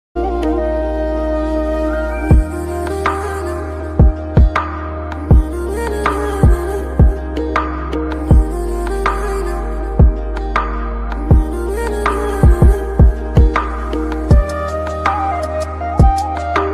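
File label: music bgm ringtone new Assamese bgm ringtone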